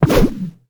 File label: weapon axe jump